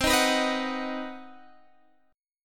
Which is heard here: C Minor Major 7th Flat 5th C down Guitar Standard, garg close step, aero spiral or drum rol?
C Minor Major 7th Flat 5th C down Guitar Standard